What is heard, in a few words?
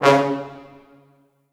C#2 R, Orchestral Brass